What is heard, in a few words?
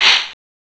SHAKER; CMI Sound Library Rev 1.3